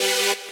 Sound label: saw